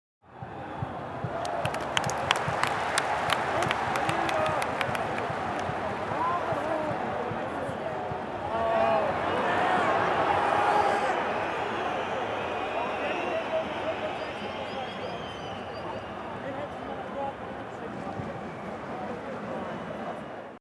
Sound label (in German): Stadion Fan und klatschen